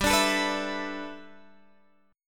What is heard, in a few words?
G 6th Add 9th, Guitar, Open G